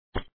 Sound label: drop topping; gamerip; Papa's Freezeria